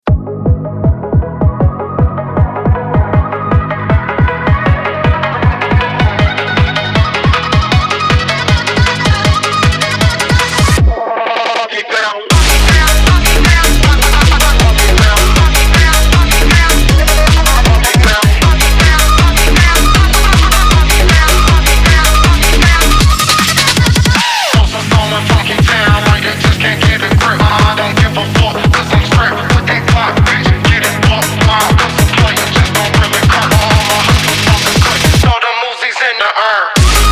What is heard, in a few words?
phonk